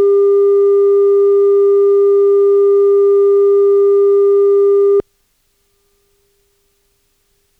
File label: 315Hz 0dB